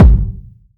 puffy kick drum one shot f key; kick drum samples

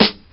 original steel snare drum sound g key

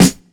natural snare drum f sharp key

